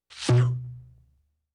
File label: comic tube doink